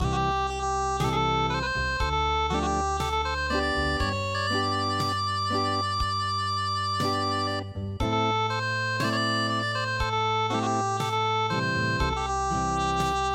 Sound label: Unplugged